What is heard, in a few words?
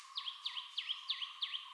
tailorbird mimicry, racket-tailed drongo